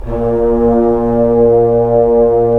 BRS Tbns; Roland - LA Composer vol. 4

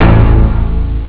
bigbass; haunted; jazz jackrabbit 2

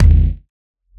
Kick, Dance Drum Kit; 99Sounds x Monosounds; Twilight

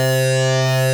SYN, 150 BPM, Drum & Bass; Ueberschall; Drum & Bass Flash Trackz

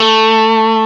GTR Tube, Dirty; Club-50 - Foundations Roland

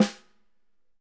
Ludwig Snare; Digital Sound Factory

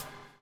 BUBL, BUBBLE; Spectrasonics - Backbeat

